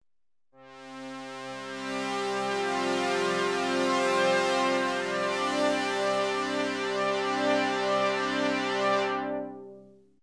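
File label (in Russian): синтезатор симфоническая заставка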